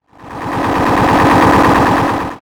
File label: Multimedia Internet CD-Rom Flash Hi-Tech